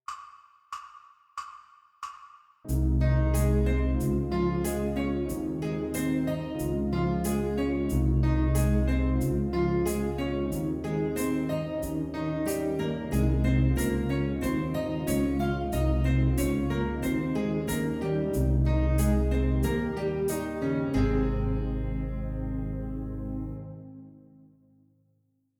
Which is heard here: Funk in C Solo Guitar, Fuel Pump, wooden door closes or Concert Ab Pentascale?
Concert Ab Pentascale